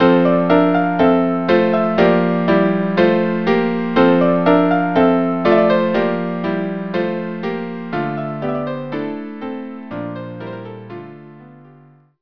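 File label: Lullaby string quartet; Grieg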